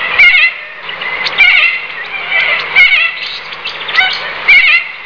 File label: Bare Eyed, Cockatoo